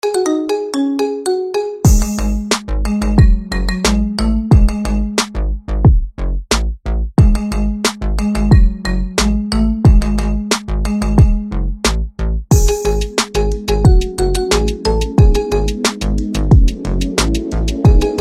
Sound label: marimba remix